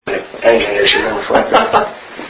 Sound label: voice breath